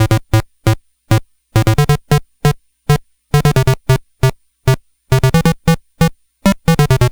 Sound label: Game Boy Bass; Nintendo Game Boy